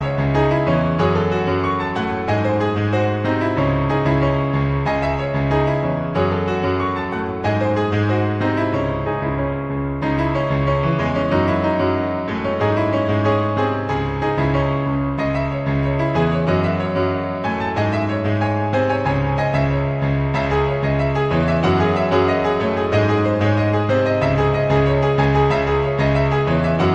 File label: croatian piano; ringtone